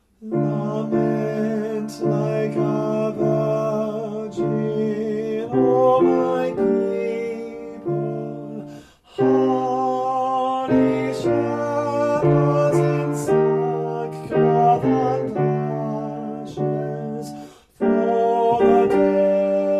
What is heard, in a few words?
tenor